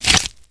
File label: flesh, footsteps infected walk; player